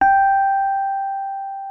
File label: Rhodes MK1 G5; Xsample VOL-1